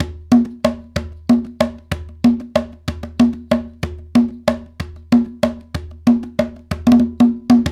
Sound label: Bembe 6/8, World Dance; Spectrasonics - Supreme Beats - World Dance